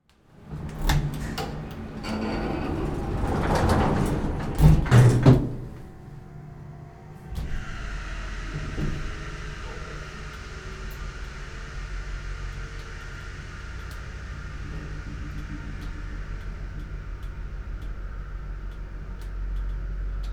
Emergency Elevator Up One Floor Interior Maximum Security; emergency effects; AdobeCC